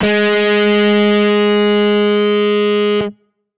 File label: guitar electronic, distortion guitar